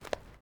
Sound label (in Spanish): Paso sandalia piedra